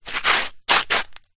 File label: footstep scuff gravel dirt; foley